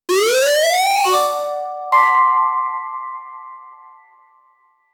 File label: jump game 2d